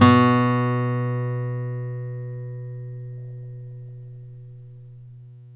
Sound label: STEEL P B1, Synclavier, GUITARS; Synclavier Sampler Library